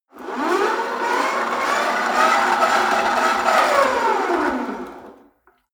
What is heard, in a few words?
rope trainer pull sequence fast long; sport freizeit; fitnessstudio